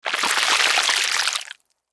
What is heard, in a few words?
Sci Fi Alien Shape Shifter Transform Juicy Slime Liquid; Whale; Whales